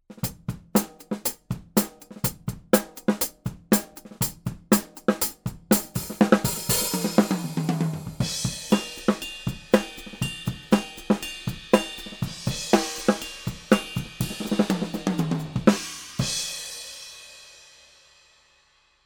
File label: sE7 cl. Ambience; sE Electronics; sE7 vs. sE8